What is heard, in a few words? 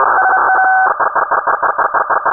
Vaccine Comp Ambient; Dystopia